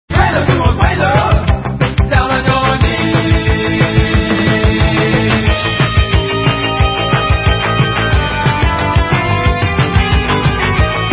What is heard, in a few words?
lofi back, prelude